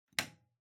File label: Light Switch-Rocker (Wall-Mid)